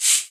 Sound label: shaker hi hat b key; percussion